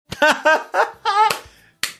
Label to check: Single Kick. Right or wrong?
Wrong